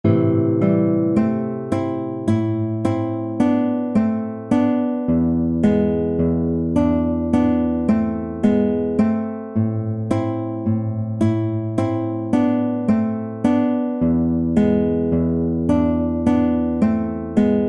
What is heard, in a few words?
prelude duet